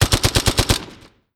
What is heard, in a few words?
mechanized units mg fire; Hearts of Iron IV Death or Dishonor